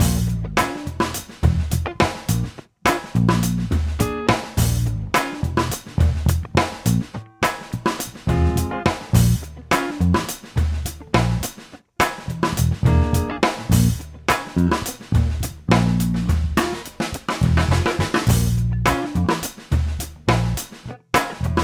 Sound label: solo loop